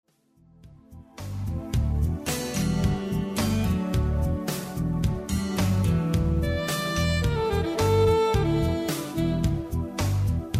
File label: Sax Lounge